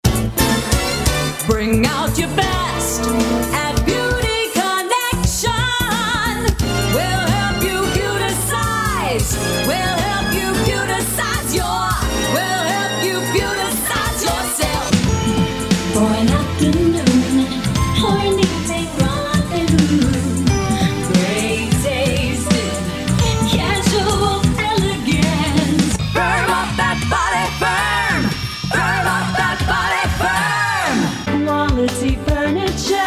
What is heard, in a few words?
Jingles and Singing Section